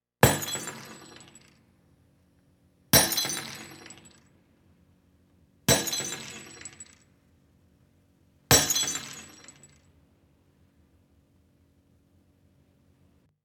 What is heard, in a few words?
Break Glass, olofonia